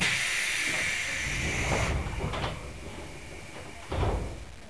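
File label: Door Close, Train; OpenBVE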